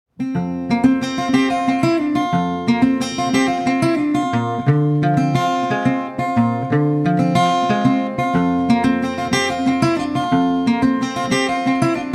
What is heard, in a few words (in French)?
madagascar guitare; ギター演奏で、マダガスカルの音楽スタイルを表現